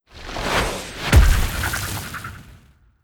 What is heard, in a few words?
Earth Quag